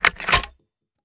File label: rechamber bolt open; reloads; gmod